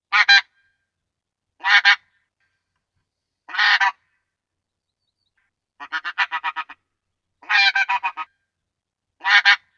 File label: Graylag Goose; Buck Expert